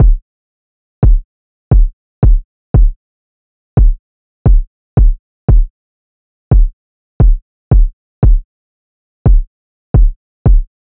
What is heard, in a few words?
Drum Loop - 175 BPM Kick; Loop Stems; Cymatics; DOPE DRUMS VOL. 1; Jumpshot